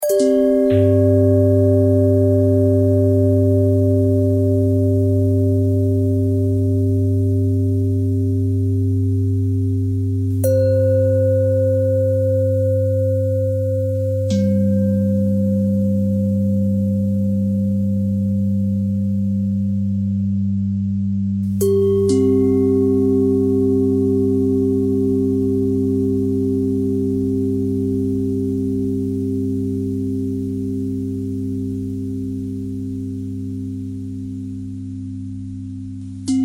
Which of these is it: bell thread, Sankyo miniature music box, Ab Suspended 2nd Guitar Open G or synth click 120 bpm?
bell thread